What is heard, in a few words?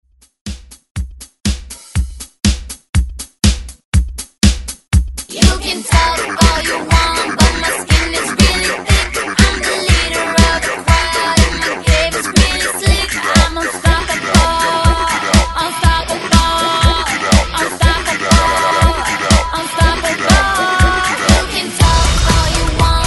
Hype Re Drum 121 Bpm; DJDOLLS